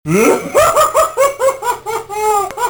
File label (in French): Rire